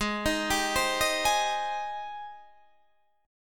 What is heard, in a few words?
Ab Major 7th Ab down Guitar Standard